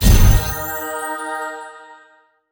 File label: Game Award